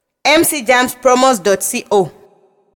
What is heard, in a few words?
Logo; Mcjams